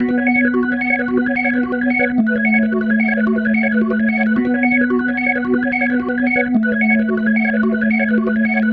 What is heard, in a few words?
Psychedelic, Organ, Loops